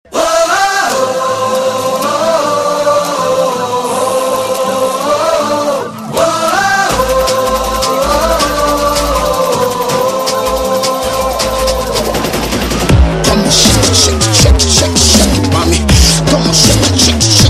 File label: Woh-ho-ho